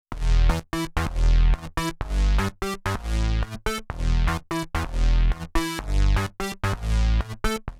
blower 16 beats; LaRochelle

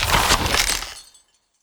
Ice; Ice Sta Poprepousse1

